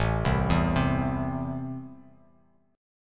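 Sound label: A Minor, chord strum; JGuitar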